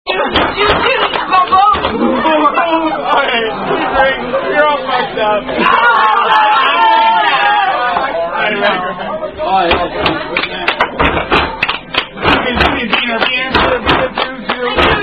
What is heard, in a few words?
Zoomy Zoomy, Drinking Game